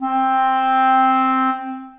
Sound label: Bb Clarinet Section Legato; Ableton; Orchestral Woodwinds